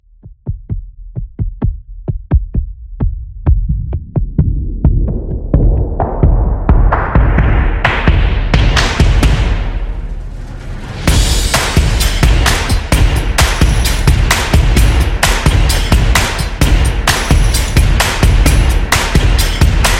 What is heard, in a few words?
Action Sport